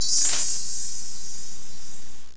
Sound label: Sparkle; Interactive Cosmos; Kosmos